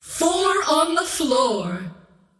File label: scream; queenofpain